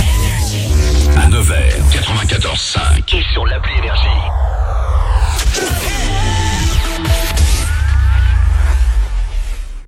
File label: JINGLE; NEVERS, 94.5 FM; NRJ